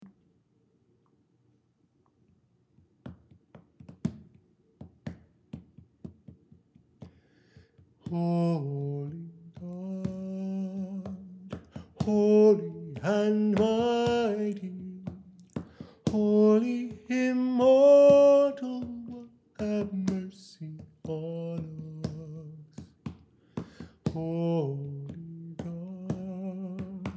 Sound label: Trisagion melody only